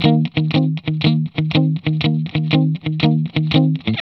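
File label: 120, Vintage Blues Guitar rhythm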